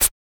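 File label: PERC SHAKER, MALE PERC; Spectrasonics; Vocal Planet; Jazz FX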